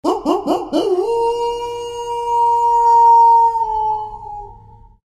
wolf howl

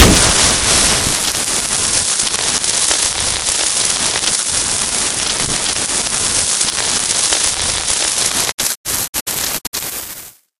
flaregun impact sentry; tf

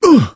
shoved, survivor voice, manager

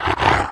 zombie dog growl; voice; idle; source engine; left 4 dead 2

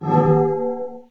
startup; windows 9x sound schemes; the golden era

